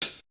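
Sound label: Hi-hat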